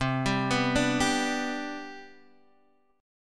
C Major 7th Suspended 2nd, C, down, Guitar, Standard; CM7sus2 arpeggiated; JGuitar